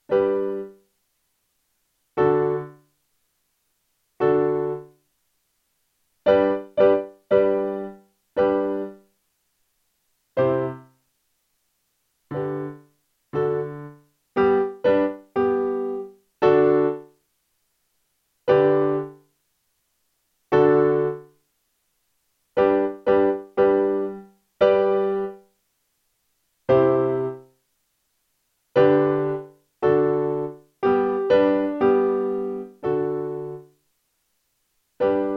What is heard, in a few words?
Accompaniment; Magic Flute